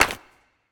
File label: clap; smite; horus